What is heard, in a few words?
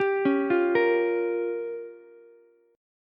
G Minor 7th Flat 5th, Ukulele; Gm7b5 arpeggiated; 12,13,13,13; JGuitar